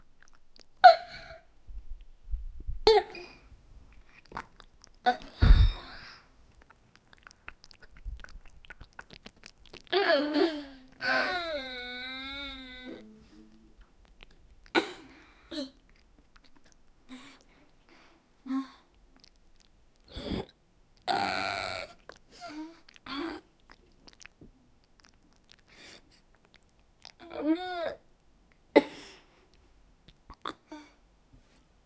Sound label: girl digesting